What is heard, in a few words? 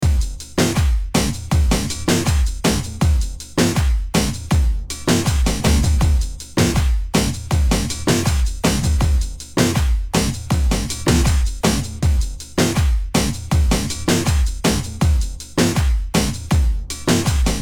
multiphonics audio fx resonator dry; richard veenstra